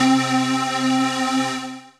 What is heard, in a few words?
Tigon Keys, Synth Keys